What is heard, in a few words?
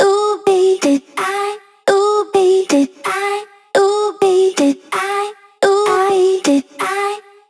Melody Kits 128BPM Root C#; Vengeance Samples